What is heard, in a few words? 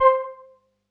505, String Machines